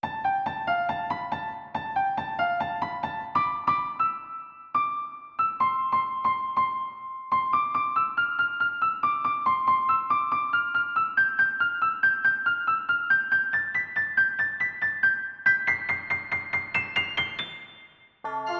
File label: Dead End